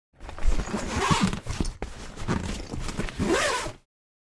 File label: Large hard case suitcase closing